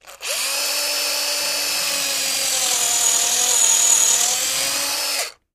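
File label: Electric Drill Small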